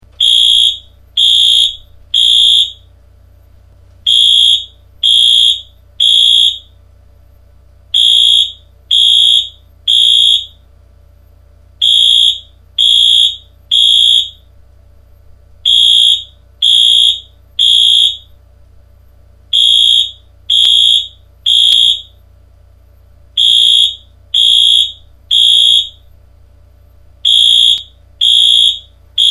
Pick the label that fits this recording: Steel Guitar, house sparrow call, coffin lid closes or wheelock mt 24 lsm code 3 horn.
wheelock mt 24 lsm code 3 horn